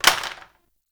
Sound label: Plastic Drop